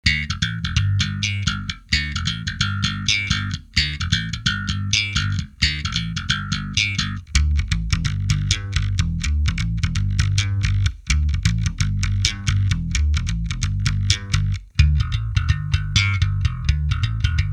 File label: Slap